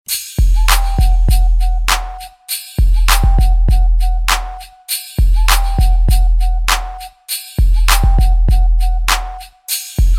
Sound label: new message tone